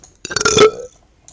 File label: real burp